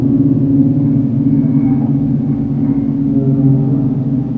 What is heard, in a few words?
Tonal Loop